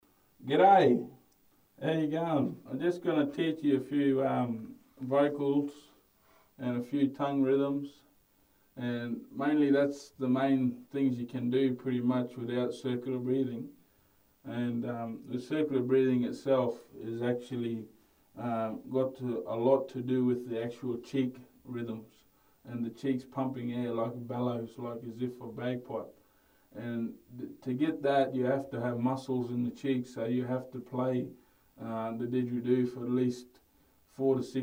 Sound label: Didgeridoo teach